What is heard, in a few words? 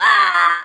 death; Alien Arena; ai